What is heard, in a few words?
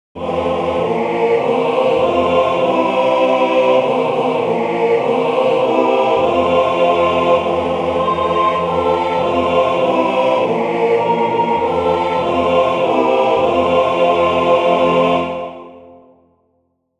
Happy Birthday quarter